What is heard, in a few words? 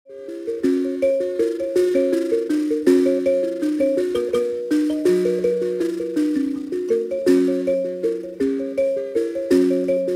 mbira